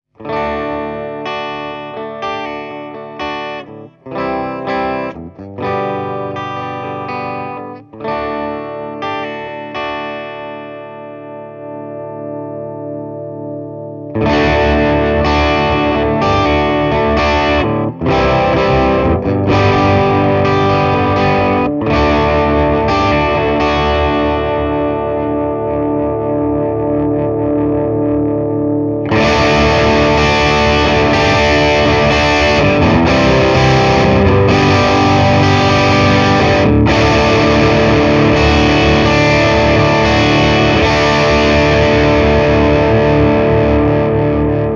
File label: bluetone fried eye 22 reverb crunch channel controlled with guitar volume; Bluetone-vahvistin kuulostaa todella mehukkaalta ja dynaamiselta myös silloin, kun kitaran volyymiä on laskettu rutkasti